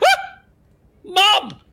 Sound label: yip